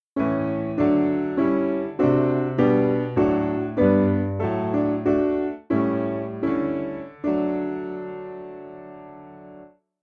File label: Piano